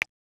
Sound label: Key Virtual, KeyTone, Beep; Samsung GT-S8600 Wave III